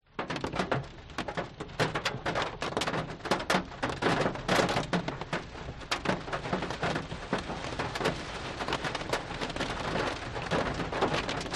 nature rain car drips light heavier bursts int perspective